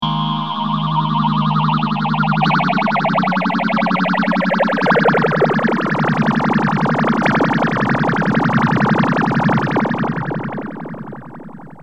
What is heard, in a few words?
Morley deluxe phaser